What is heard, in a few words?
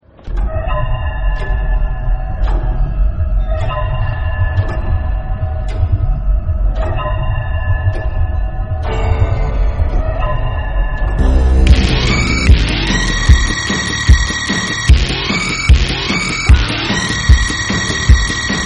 tick mix